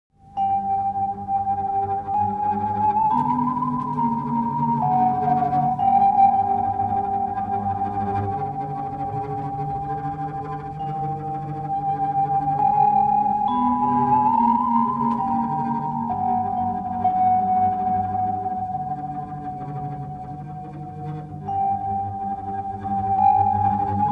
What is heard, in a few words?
suspense musical saw